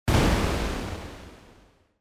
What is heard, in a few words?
Gunshot or Impact; castelloe